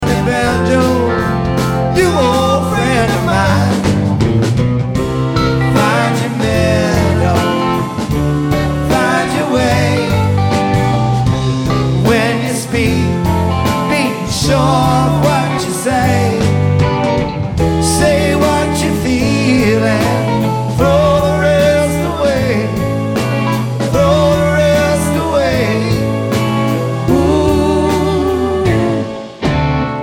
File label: Banjo